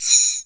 REV BELLS; E-MU Formula 4000 Series Vol. 2; Dance Set 2

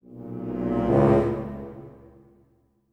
HIT, orchestral; Orchestral Loops; FULL ORCH ACNT

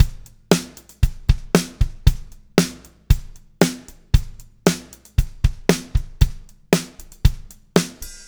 MED POP, Backbeat, L

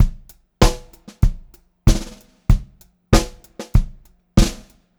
VARI, Pop-Rock Drumloops

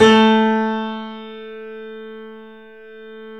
A, 3-D Audio Collection, 3D Pianos, Yamaha C7

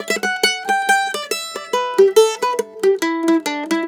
MANDOL 140, Celtic Flavours; USB Soundscan vol.42; AKAI

